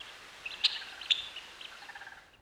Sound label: crane chatter; ambient; anubis